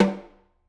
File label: Snr Off, drum